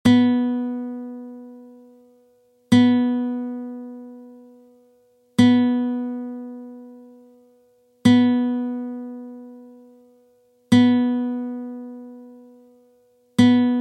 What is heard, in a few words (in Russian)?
struna Zvuki strun klassicheskoj gitary